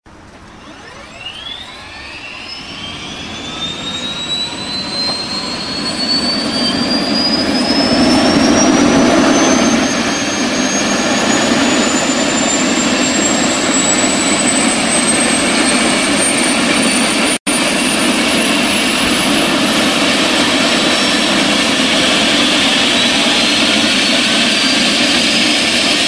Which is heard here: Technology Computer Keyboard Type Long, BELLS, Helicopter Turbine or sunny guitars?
Helicopter Turbine